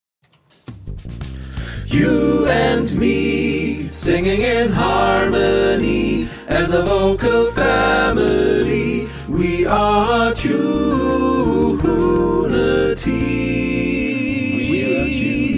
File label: tutti